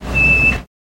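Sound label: Car Brake Small Squeak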